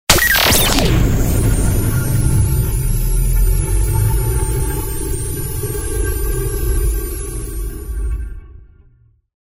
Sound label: Galery Noise; Sound Ideas - Galery Noise 002